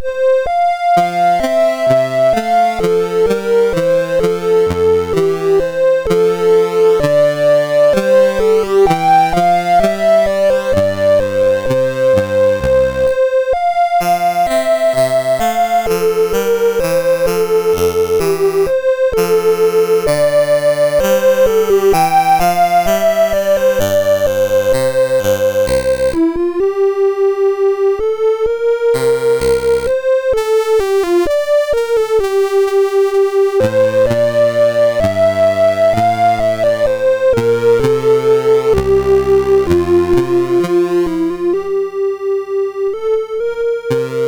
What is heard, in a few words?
Air in F, MOS6581R3